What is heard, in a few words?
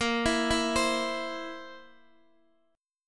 A sharp Suspended 2nd Flat 5th A sharp, Guitar DADGAD